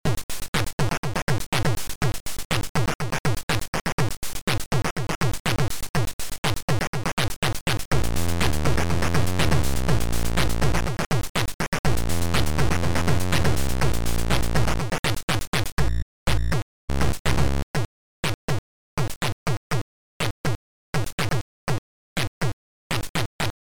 GLOOMY WAR MUZAK

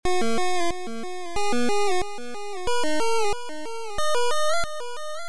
Echo Solo

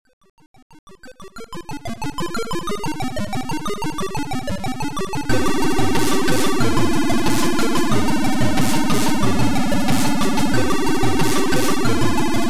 hardcore

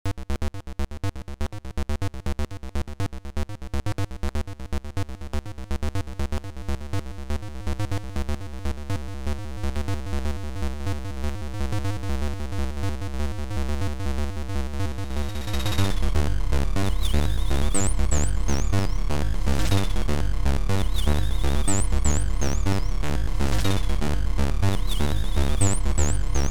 Echo Saw; Brain electrosopr

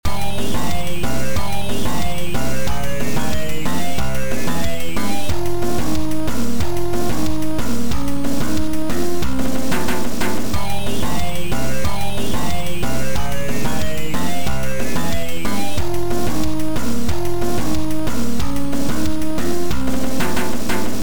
ROCK

